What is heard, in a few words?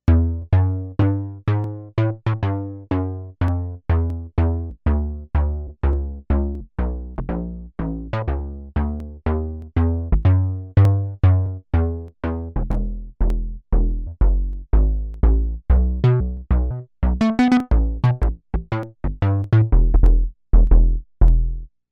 Bass